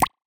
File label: notification beep sound; Xiaomi Mi-CC9